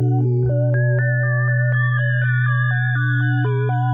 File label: 122 BPM Sequence A maj F min Tonic B; mmb studio soundtrack essentials